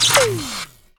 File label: Electricity - Down; Ed Edd n Eddy; Adromulus Pack